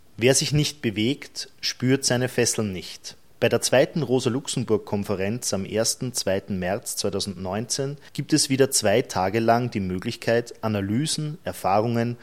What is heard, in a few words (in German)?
Jingle; Radio Orange 2019